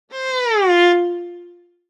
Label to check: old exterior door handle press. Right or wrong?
Wrong